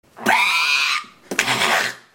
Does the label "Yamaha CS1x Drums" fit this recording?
No